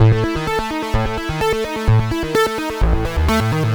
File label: Sequence, 128bpm, A, analogue circuit; musicradar